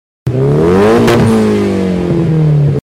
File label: Turbo exhaust sound